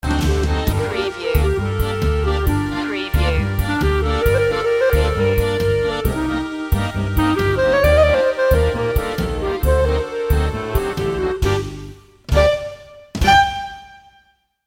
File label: tango francaise sting